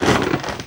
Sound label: Creak House Pre Break; SFX Kit; Creaks, Scrapes, & Misc